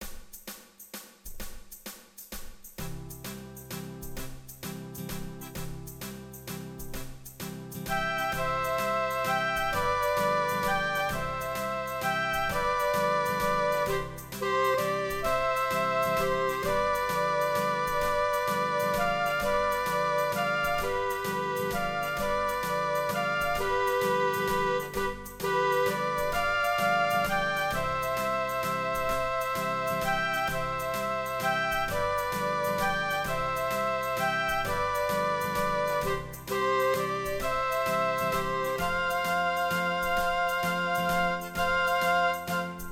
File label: Waltz Cajun Waltz